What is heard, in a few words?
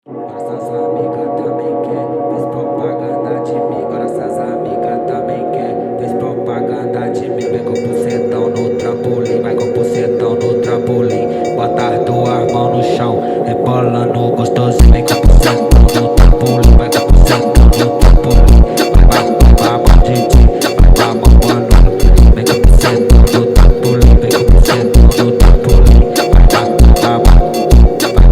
Phonk